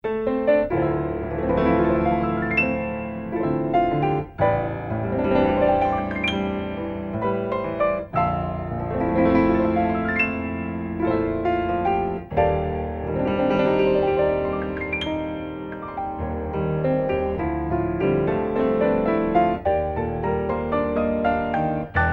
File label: Arpeggiator